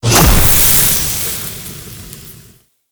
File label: Spit Attack Impact; Warframe; The New War; Archon; Nira; Abilities